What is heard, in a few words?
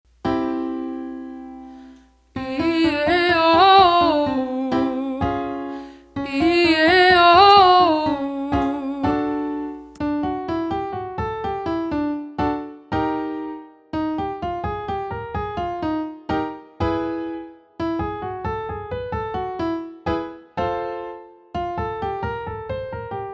5 note e eh ah oh oo, vocal exercises